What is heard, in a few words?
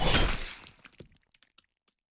Blade; Scrap Weapons; Zomboid